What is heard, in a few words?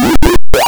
LEVEL DONE; Shadow Knights